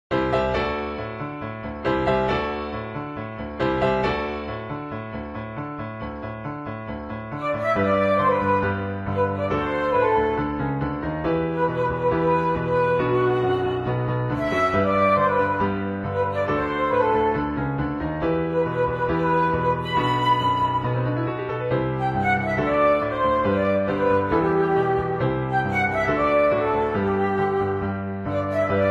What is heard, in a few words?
Flute Piano